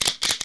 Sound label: camera